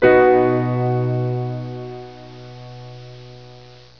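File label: PIANO CHORD B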